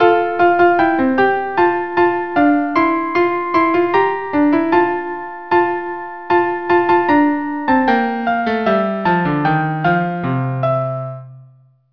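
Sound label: violin viola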